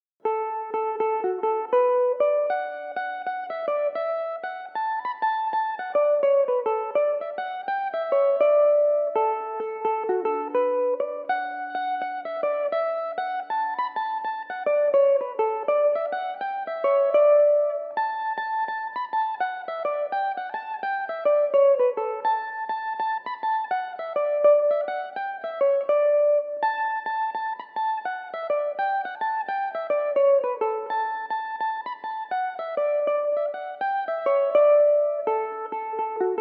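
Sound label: D major, Irish mandolin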